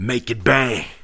Rap Vox

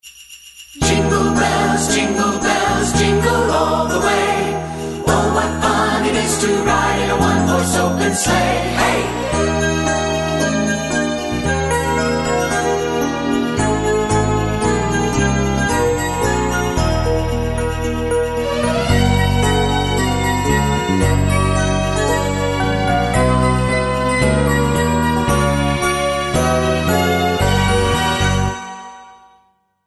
jingle bells

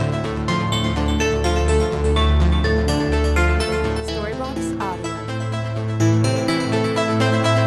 Ambient House Melody Arp 125BPM D

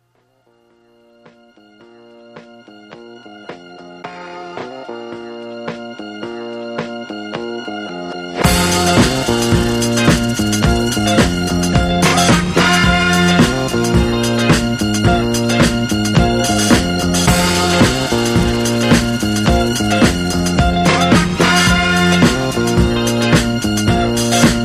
disco

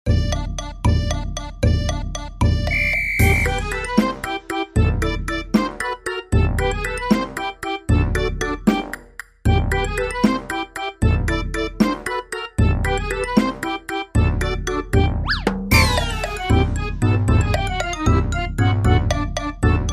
tiddlywinks

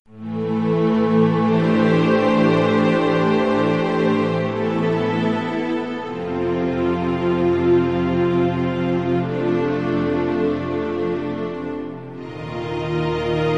orchestral trans

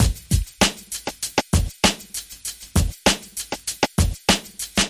WHISTLER Dm

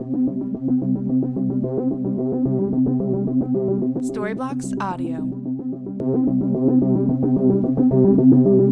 Ambient House Arp 110 BPM C

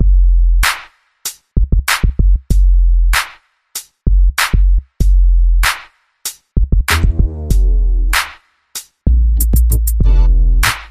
Horns, 96

